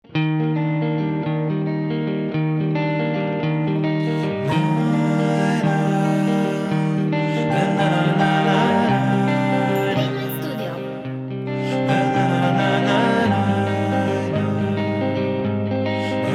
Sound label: Dreaming voices